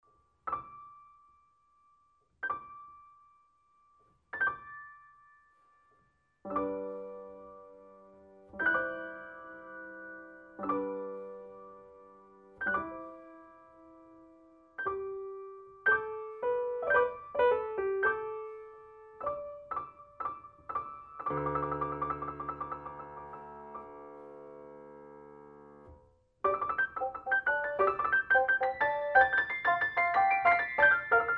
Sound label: piano solo